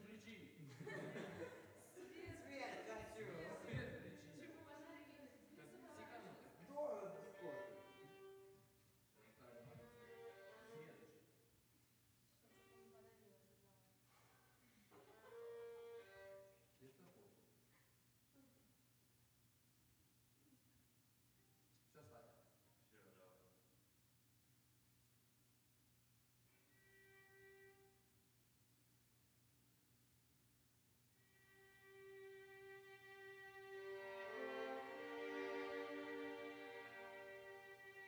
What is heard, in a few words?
Piano